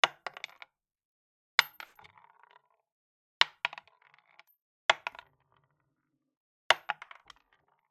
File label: Casings Shell & Cartridges - Drop bounce and roll on Wooden Floor - Outdoor; SculpTunes